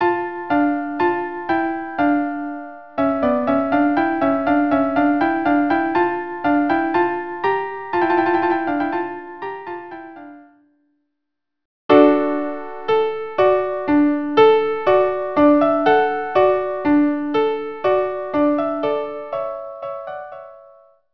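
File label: violin viola; fireworks minuets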